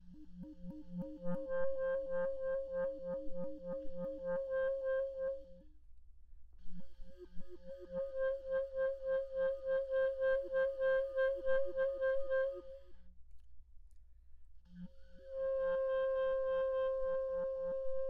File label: d trills with g sharp a and trill keys